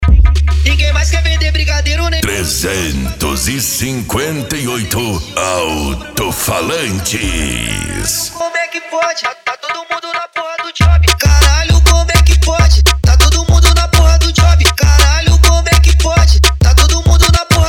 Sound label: funk mega bass